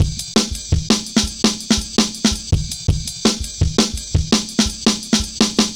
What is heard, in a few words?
Jazz Ride A, Drum Loops, 166.5; Zero-G Jungle Warfare 1, 2 & 3; 90 s Sample CDs